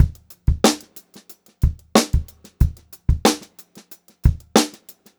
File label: BEAT, Funk-Groove Drumloops; USB Soundscan vol.38; AKAI; 90 s Sample CDs